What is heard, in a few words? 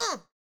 Chant